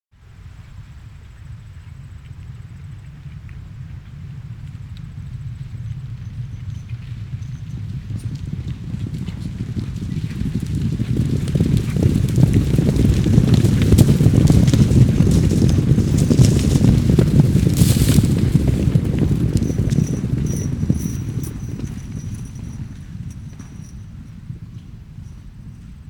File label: bison stampede